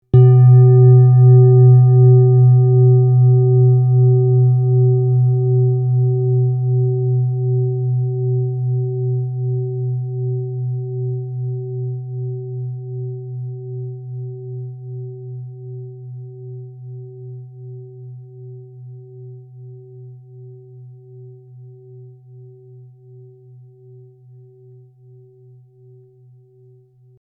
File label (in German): klangschale sonne